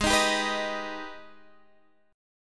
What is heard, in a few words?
Ab Minor Major 7th chord